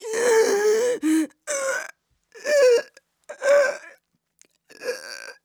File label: Female Choking Strangled; AudioDesire